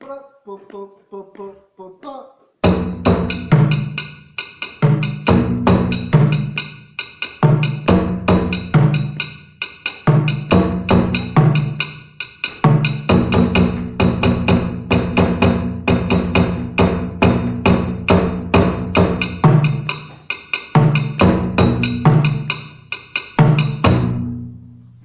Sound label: Sorsonet Dunumba Sangban